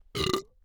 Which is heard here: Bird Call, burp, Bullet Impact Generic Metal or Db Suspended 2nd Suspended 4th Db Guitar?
burp